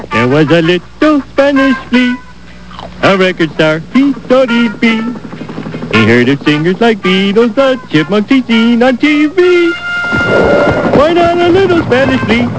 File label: sing spanish; homer